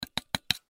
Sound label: Wire Brush Tap Wood Shake Out Clean; Hand Tools; INDUSTRY; Blastwave